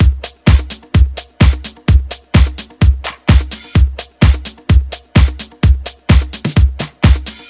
Housebeats 128BPM; Vengeance Samples

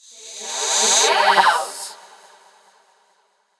Vocals; Vengeance Samples; Special Sounds

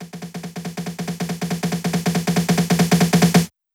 140BPM SNARE 2 Bars; Vengeance Samples; Snares&FX Pairs

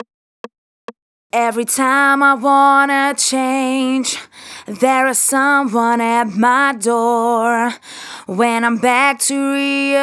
Verse A, 136 BPM; Vengeance Samples; Breakaway

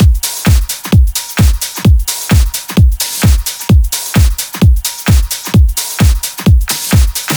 130BPM Kit; Vengeance Samples; VFH1 130BPM Change Kit

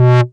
Bass Multi Rev H; Vengeance Samples

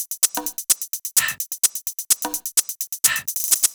Pitched Drum Mix, 128 BPM; Vengeance Samples; Vocal-Hook Kits; Song Kit

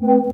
Synth Shots; Vengeance Samples

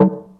Minimal Perc; Minimal Percussion

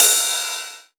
Ride; Vengeance Samples; VEE Cymbals

